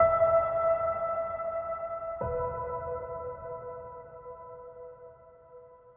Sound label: Piano Reverb High Ultra